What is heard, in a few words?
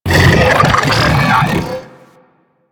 Sentient Attack, Enemies; Warframe; DE; Sentients; Ortholyst